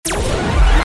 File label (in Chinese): 信息栏持续得分